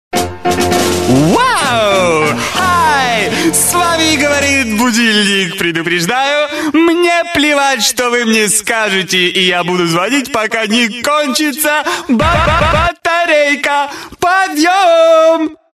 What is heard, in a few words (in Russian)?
Veselyy budilnik; Веселый будильник пофигист